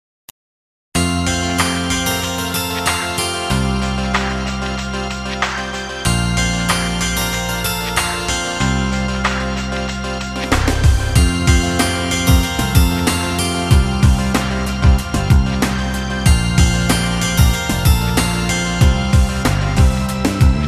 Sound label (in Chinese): 纯音乐背景音乐